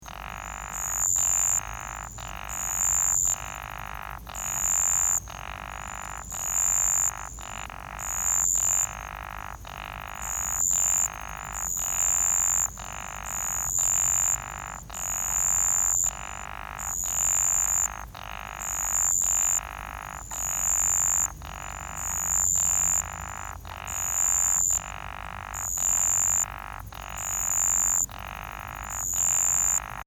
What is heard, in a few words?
Glandirana Susurra Is Calling